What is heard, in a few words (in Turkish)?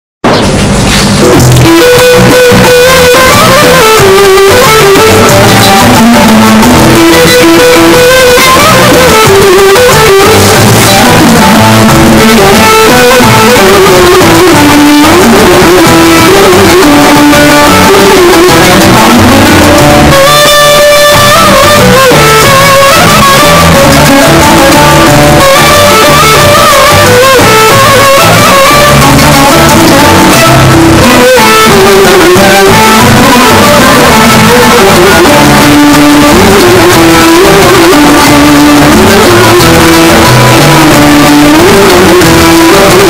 fon müzik earrape; akasya duragi